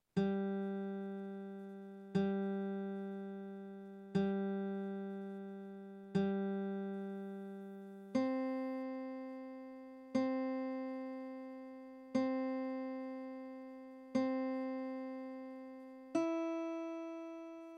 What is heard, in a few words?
low g, ukulele